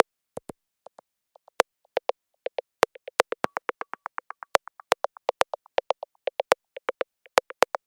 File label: 122 BPM Claves; mmb studio soundtrack essentials